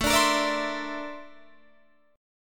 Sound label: Bb Major Sharp 11th A, chord